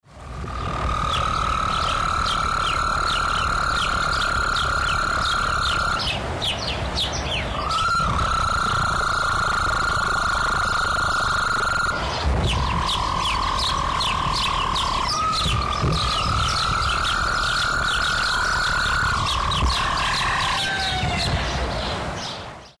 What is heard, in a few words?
viridis jour; Le crapaud vert, Bufotes viridis sitibundus, s'entend dès avril: chant de jour; sur l'île de Lesbos en avril; Prise de son: Yves Tieche